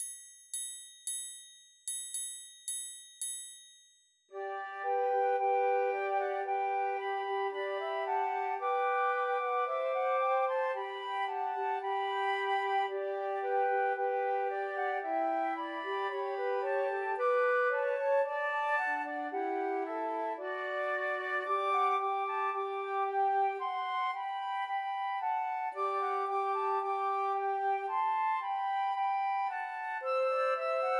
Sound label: Trios for Three Flutes; Hark The Herald Angels Sing; Christmas